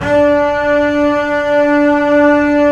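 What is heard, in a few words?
Bass Legato D4; Multisamples; Digital Sound Factory Virtuoso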